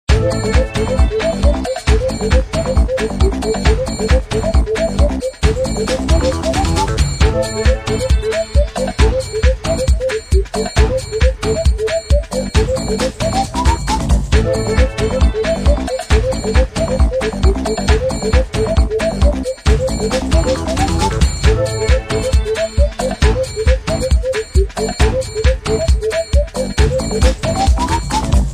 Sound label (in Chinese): 音乐铃声